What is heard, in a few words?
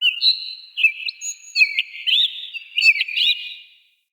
Forest Open Glade Bird Far; Day